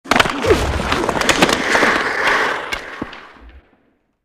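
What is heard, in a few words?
Hockey Player Fight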